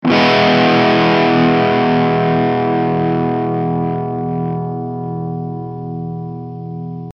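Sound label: Notes Distorted E; Hylics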